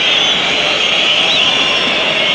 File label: whistling, Crowd; David Beckham Soccer; XBOX